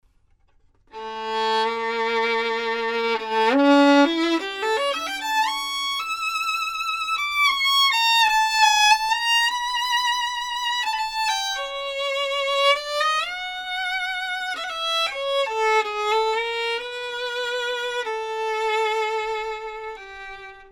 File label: violin, professional